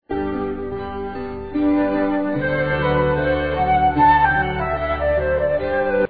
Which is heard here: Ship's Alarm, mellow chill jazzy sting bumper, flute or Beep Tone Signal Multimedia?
flute